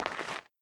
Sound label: dirt footstep; adams venture origins